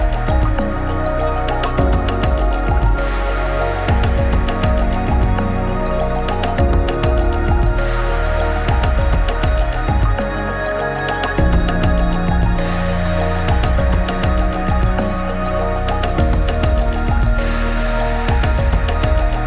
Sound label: home music